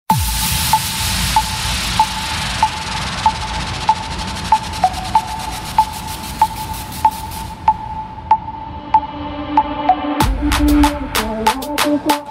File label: Hip Hop